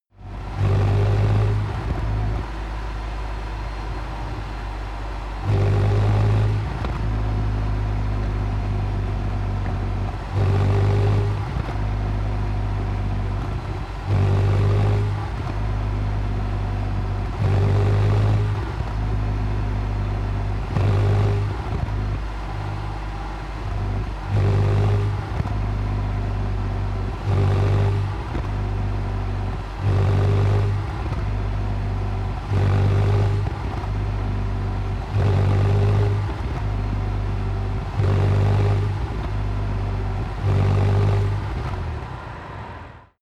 sports car int engine short thrusts sequence rpm low; verkehr transport; autos